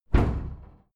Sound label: cupboard close hard